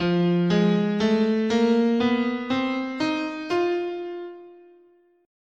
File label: F Mixo Blues, scale